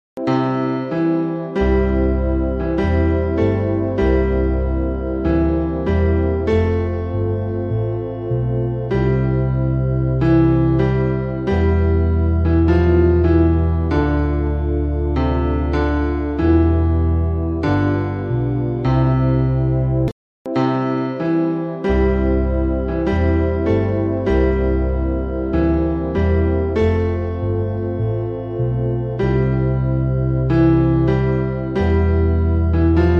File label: Bass; Michael Row Boat